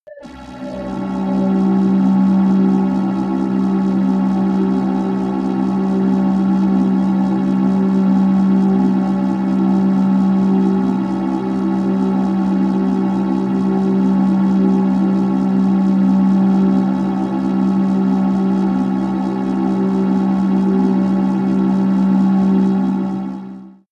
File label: drone sci-fi generator